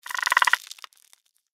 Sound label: mirelurk idle; npc